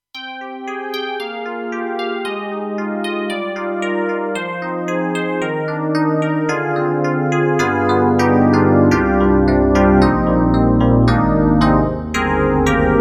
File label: Tine Pad; KORG 03RW